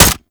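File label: crossbow Shot; Warframe; Tenno; Primary Xbow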